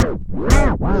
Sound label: ST SCRTCH, SCRATCH